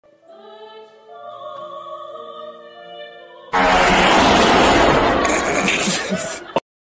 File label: loud fart during church